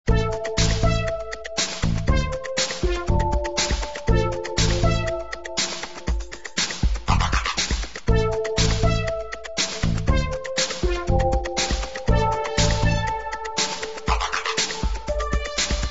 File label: Electro